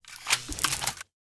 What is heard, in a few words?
Electric B90 Crossbow Load; Nova Sound